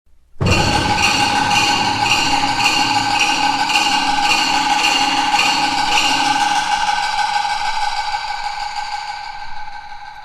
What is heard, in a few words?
Unseen Evil Lurking